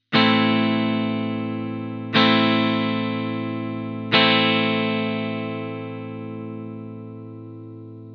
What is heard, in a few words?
chord a minor, guitar